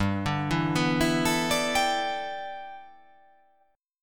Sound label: G 6th Add 9th G, chord